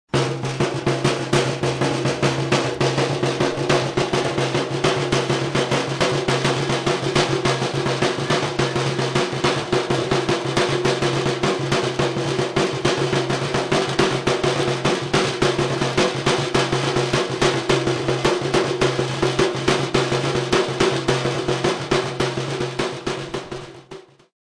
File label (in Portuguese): cocos instrumentos caixa